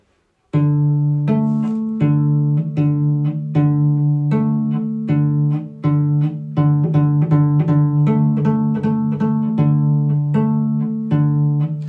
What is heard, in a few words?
Cello pizz; gezupft